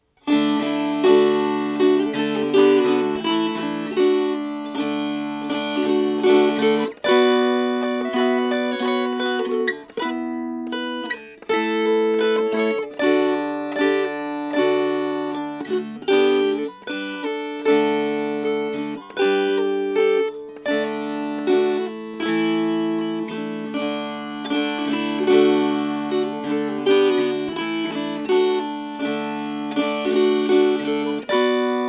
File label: Strummed, Dulcimer